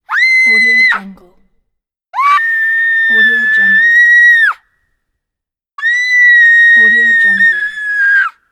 girl screams short and long

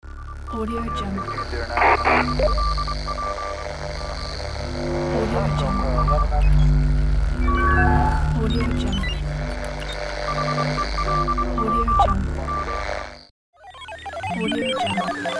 futuristic radar scan

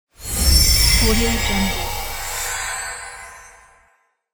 magical spell sound

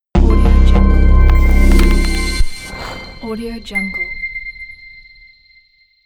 tech hard logo

